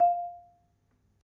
F3, Marimba; Terkelsen

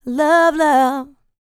licks, vocal, dance; ILIO; Vocal Planet

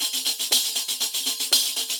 120, MIXED DRUM LOOPS 120; World Instruments 2; WORLD DRUMS

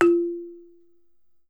BALAFON, African Melodic; E-MU Producer Series Vol. 7 – Old World Instruments

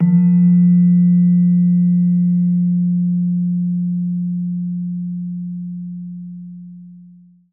PELOG F1, PELOG BASS, Asian Traditions; Soundscan vol.60; AKAI

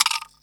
Vibra SL fx; 300 Drum Machines

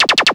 Blaster Fire, cartoon Foley Fx

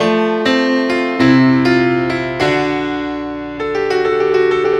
Piano; Sonic Foundry (Sony Creative Software) - Rondo Brothers Trip-Hop Loops & Samples; El Corazon

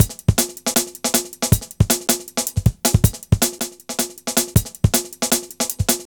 Flying 158, Drum Loops, Jungle; Jungle Warfare 1&2

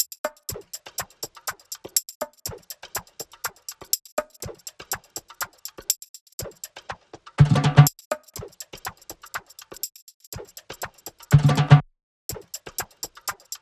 loop perc 122; urania; Seismic Loops; SEISMIC2

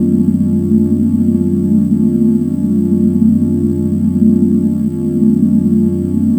Drone Ambient; Drums & Drones

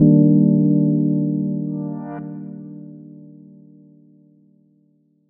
Bmaj7, Chords; Electronisounds

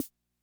Snare, rhythm330; classic machines

